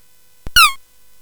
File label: Space Gun